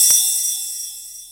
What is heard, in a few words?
JD800 Internal Drums, Roland JD800